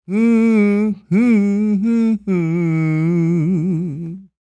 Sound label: SING, Blues Soul